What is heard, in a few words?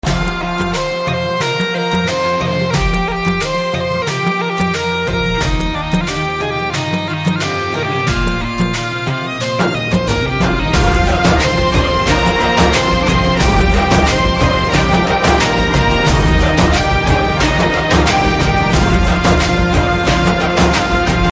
Instrumental Ringtone; Dabangg